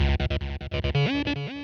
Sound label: 140bpm 303 slide